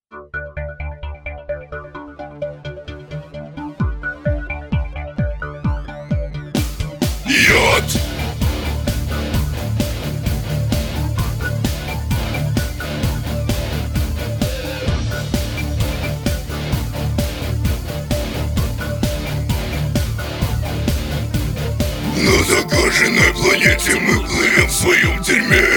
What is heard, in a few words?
Industrial Metal